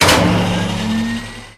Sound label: Machine Powerup; Lucius III; pc linux